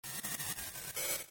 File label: Coin; Darius II (Sagaia); Genesis